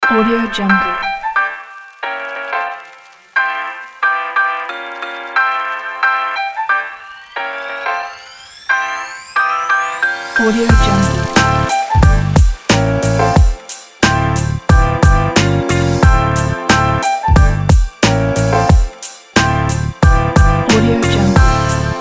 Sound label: jazz groove fashion hiphop